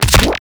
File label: Weapon Punch Shotgun; Weapons; Shotguns; Warframe